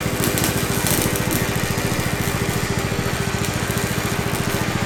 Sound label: laser beam cutter; avant gardens